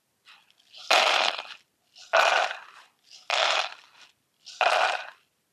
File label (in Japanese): sunadokei marakasu